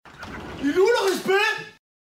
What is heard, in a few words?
Woop